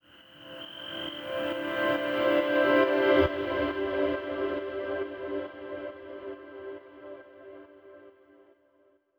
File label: 137BPM Reverse Up; Sound FX; D minor; Vengeance Samples; Universe Kit